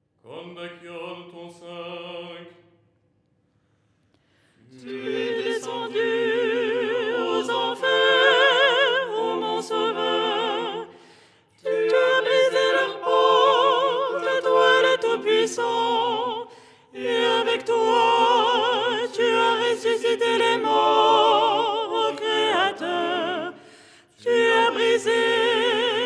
ton5 soprano

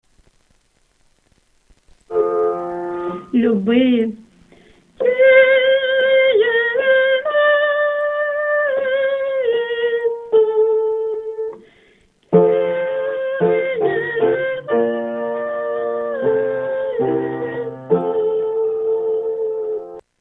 kyrie tenor

